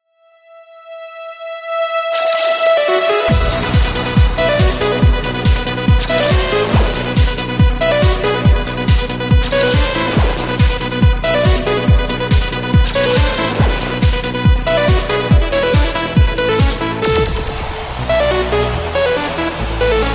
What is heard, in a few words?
nokia fast mix dance; latino